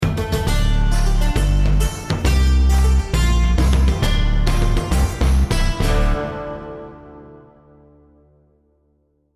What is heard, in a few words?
won round